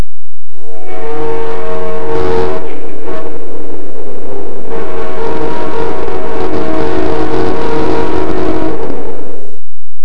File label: diesel